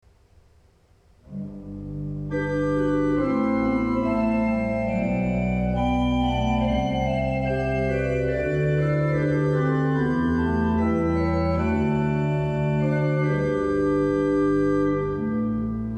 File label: Organ; Good News For The Shepherds